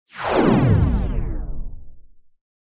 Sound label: Descend Electronic Descend with LFE, Reverb